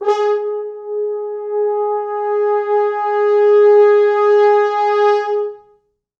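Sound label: 4 French Horns, Horns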